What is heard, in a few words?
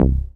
DM BAS, Dance Mega Synth